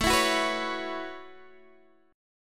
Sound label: A 6th Add 9th, Guitar, Open G; x,14,14,11,12,11